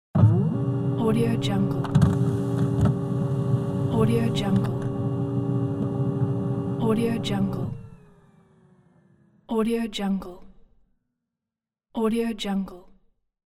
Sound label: clean printer sound